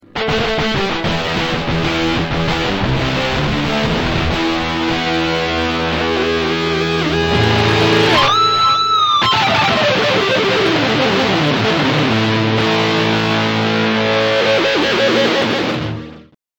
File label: Guitar solo